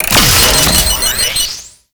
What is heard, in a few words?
Mine Cap Open; Tau; Twelve Minute War